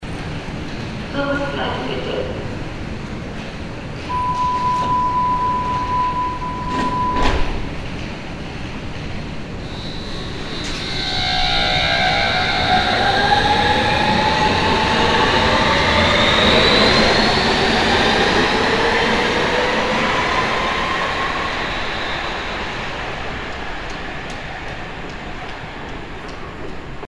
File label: subway departure